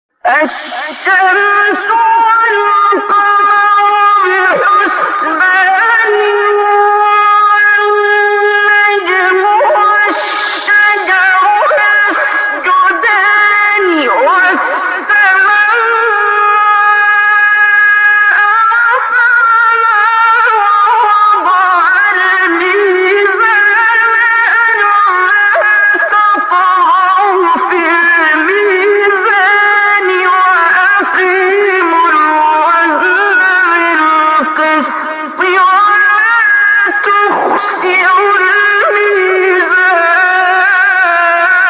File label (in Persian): فرازی ملکوتی از سوره الرحمن; استاد عبدالباسط